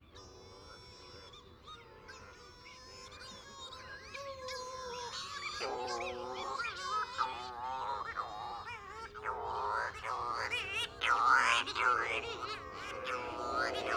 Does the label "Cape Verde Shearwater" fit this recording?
Yes